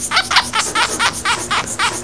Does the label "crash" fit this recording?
No